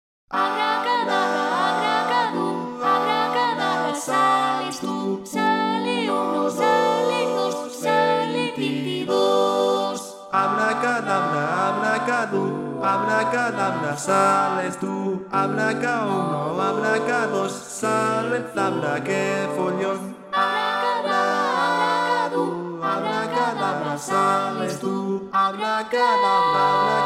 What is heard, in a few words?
Magic Rondo SATB